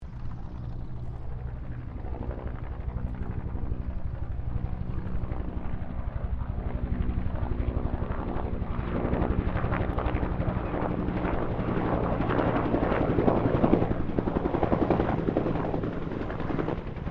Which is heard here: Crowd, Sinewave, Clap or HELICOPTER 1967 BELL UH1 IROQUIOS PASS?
HELICOPTER 1967 BELL UH1 IROQUIOS PASS